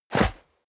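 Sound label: step dress boots sand jump, footsteps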